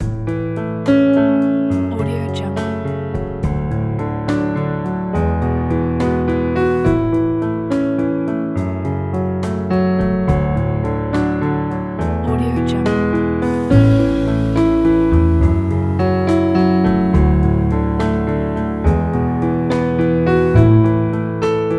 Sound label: romantic love story